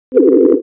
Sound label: cluster sine